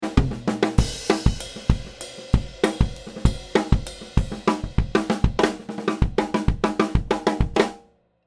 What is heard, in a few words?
Drums